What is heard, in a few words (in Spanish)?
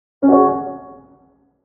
alerta